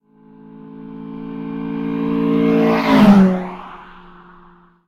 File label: car; Blind Drive